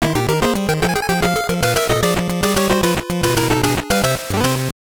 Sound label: Jingle; Tokoro-san no Setagaya C.C.; GBC